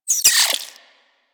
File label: vocal pain, critters; Warframe; Hunting Critters; Nexifera